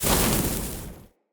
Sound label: Short Sword Fire Throw; Weapons; Elemental